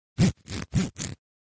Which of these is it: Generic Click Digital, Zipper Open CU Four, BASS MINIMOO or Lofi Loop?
Zipper Open CU Four